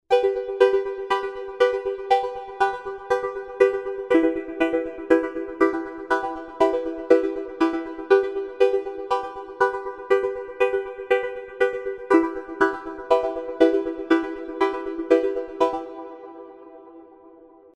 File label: Synth Mania, ringtones